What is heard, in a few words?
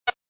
Beep